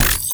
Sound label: Pod Shotgun Reload; Weapons; DE; Warframe; Tenno